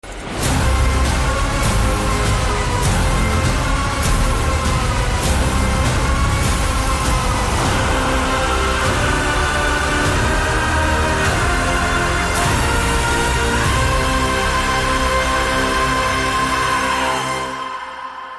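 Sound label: Teaser background music Hindi Telugu Ringtone; KGF Chapter 2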